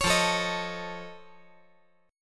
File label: F Augmented 7th F, chord